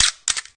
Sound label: camera click long; Vivo; E1T